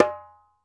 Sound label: ASHIKO, African Drum